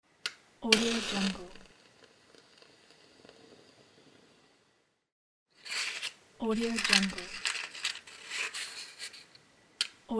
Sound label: lighting matches